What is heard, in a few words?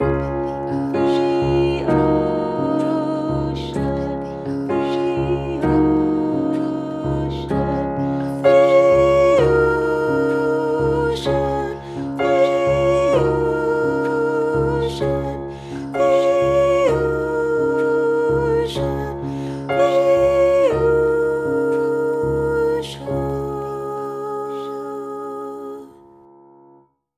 END HARMONY